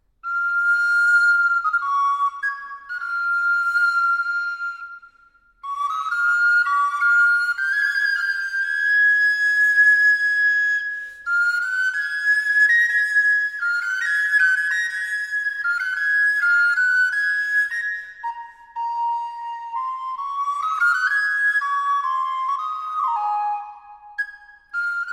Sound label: For Solo Recorder